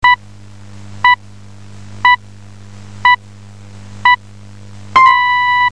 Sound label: radio pips